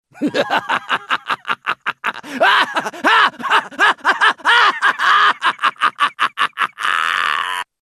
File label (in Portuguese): risada; trundle lol